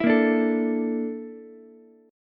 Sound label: Bb Major 7th Flat 5th Bb Ukulele; BbM7b5 strummed; JGuitar